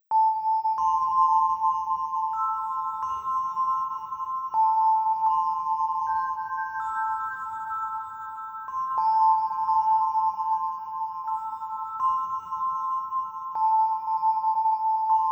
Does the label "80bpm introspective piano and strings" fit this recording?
Yes